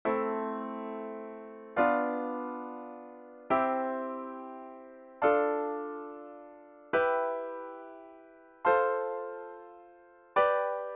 Minor Chord Progressions Natural Minor Scale Chords